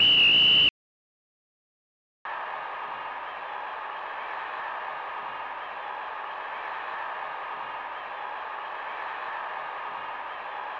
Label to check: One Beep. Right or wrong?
Wrong